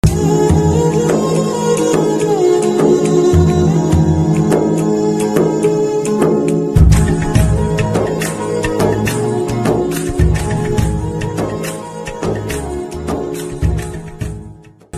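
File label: love cover background music ringtones; kotha bangaru lokam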